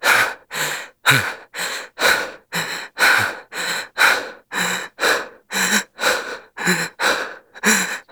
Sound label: Run Breathe Loop; Characters; Warframe; The New War; Veso